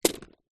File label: office crayons handful drop carpet